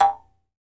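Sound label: Jaw Harps, Pitched Percussion; E-MU Producer Series Vol. 7 – Old World Instruments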